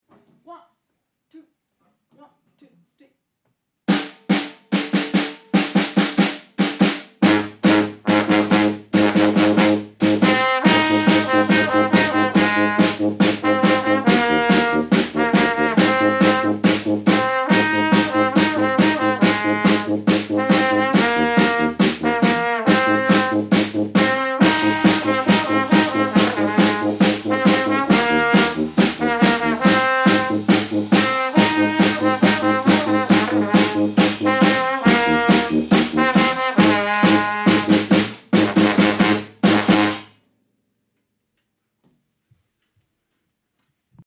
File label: Jingle